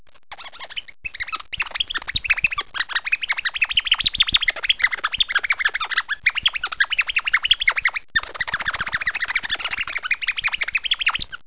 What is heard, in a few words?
angry squirrel